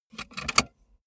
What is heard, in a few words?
car bmw e46 interior safety belt insert clink